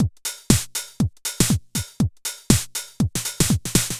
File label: House Drum